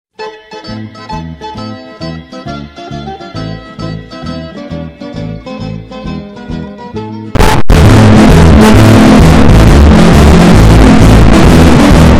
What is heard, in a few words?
tarantella hardbass